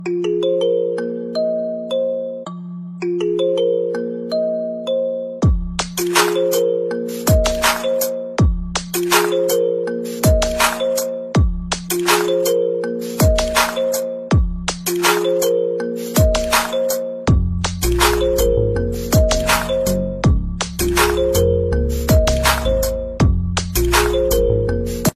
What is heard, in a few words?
Samsung Galaxy Z Flip 4